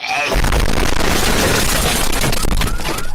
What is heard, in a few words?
sneeze boom